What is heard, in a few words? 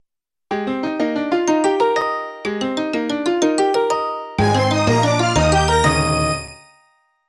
ringtone; cingular att